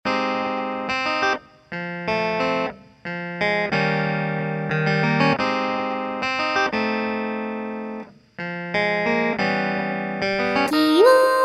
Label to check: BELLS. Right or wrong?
Wrong